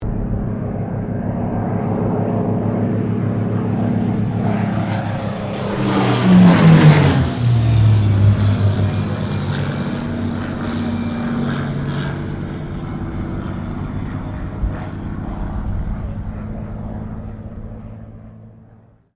AIRCRAFT SUPERMARINE SPITFIRE PAIR PASS; Airplanes; Vehicles

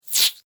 Insect Hiss Angry, Insect, Hiss, Angry, Bug

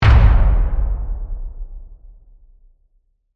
Hit Impact Reverb; Production, Element, Imaging, Accent, Transition; BlastWave

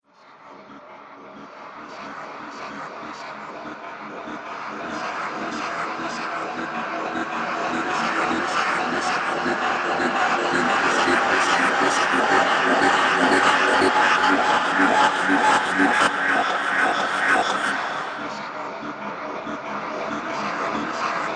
Drone, robot; The Droids Are Restless; Robot Sound Effects

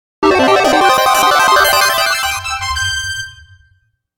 Logo Digital Blips Warbles Trumpet; Production Element Imaging Element Accent Transition; Sonic Logo; 5.1 STEREO MIX; Blastwave

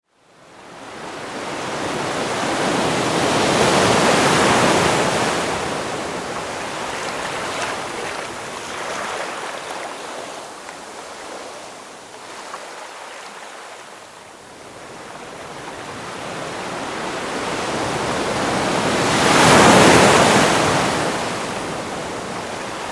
Ambience, Ocean, Waves, Front, To, Rear; 5.1; BlastWave

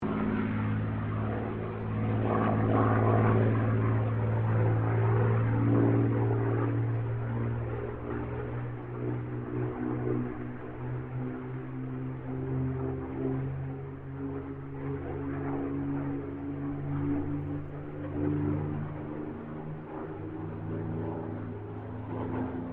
AIRCRAFT SUPERMARINE SPITFIRE MK-XVI PASS

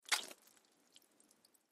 Snow Scuff Slush Puddle; Snow (Sound Effects:Weather); Blastwave

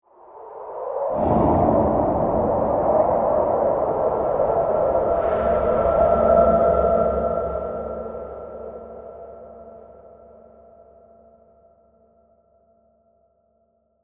Horror Swell Wind, Haunting; Scary Soundscape

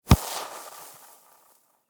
Soccer Ball Dribble Single; Sports

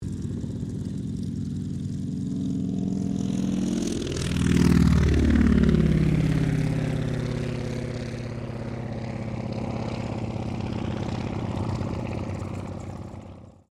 AIRCRAFT CAC WIRRAWAY TAKEOFF; CA-16 Wirraway; Airplanes (Sound Effects:Vehicles)

